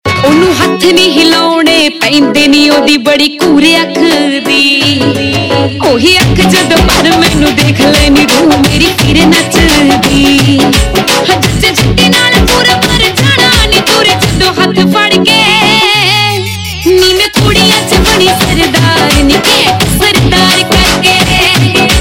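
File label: Ringtone, Punjabi Pop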